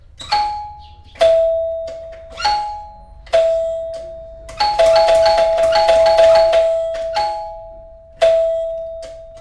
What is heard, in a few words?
doorbell